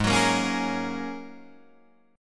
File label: Ab 9th Suspended 4th Ab down Guitar Drop D